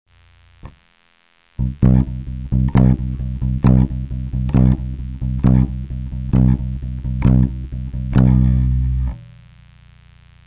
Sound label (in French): accent, bass